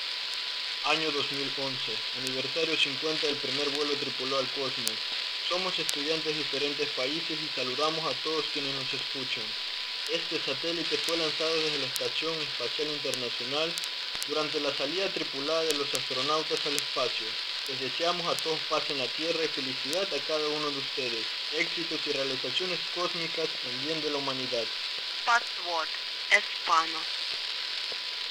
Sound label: arissat espania